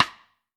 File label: Snare Drum, 5X14; Q Up Arts - Johnny.C's Vintage Snare Drum